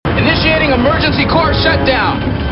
shutdown

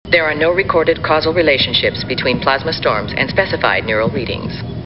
plasma

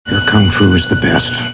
kung fu

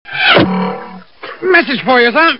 message

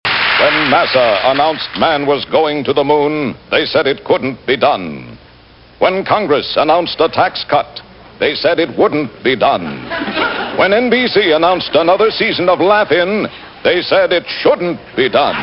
laugh

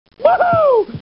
woohoo

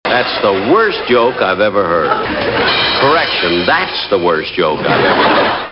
laugh

